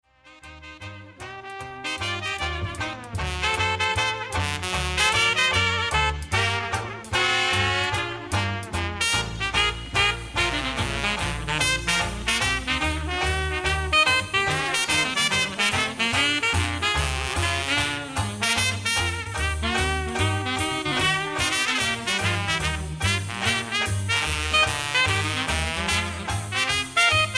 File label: Blues